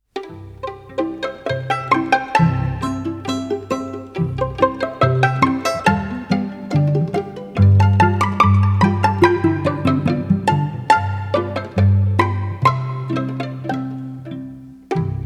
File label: pizzicato